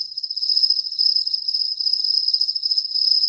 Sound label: forest